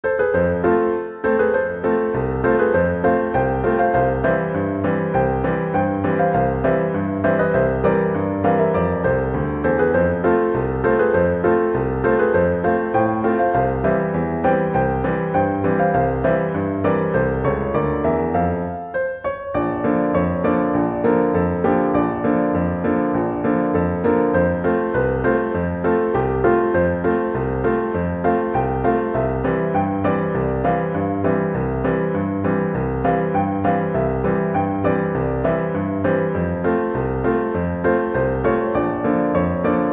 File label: piano